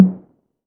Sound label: Tom Hi, Drum Machine; Playdate SDK